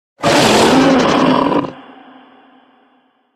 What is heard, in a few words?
creature roar; sonniss gdc